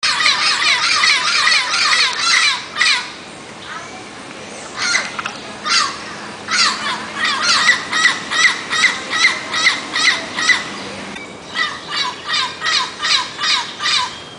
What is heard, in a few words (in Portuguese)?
Som Papagaio verdadeiro